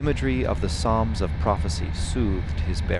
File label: noisy